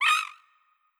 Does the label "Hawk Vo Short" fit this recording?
Yes